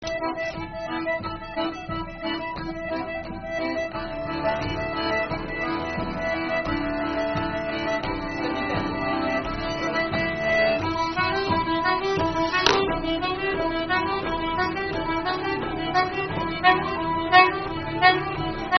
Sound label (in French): Andro intro violons contretemps et diatos mi tiré poussé